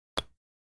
Darts; Pulling Dart From Target Board As It Clips Another.